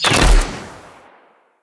Bow Shot Heavy; Sinecure

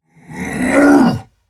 Attack Effort; Warframe; Dax Heavy; Battle Barks

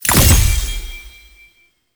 UI Mod Rank Up Selection; Mods

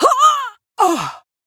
Death; Warframe; Corpus Lich; Persona B

